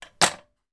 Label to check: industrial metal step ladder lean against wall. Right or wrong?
Right